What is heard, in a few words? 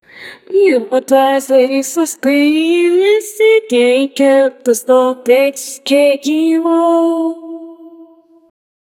woman singing in a catchy; vocals